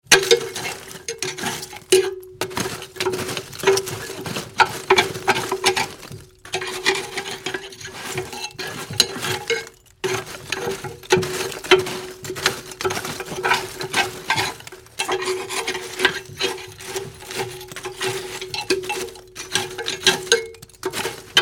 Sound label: Raking in Cast Iron Fire grate, Various raking in stove; Furnaces; Tom Hutchings